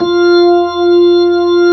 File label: B3 HAMM F4, ORGAN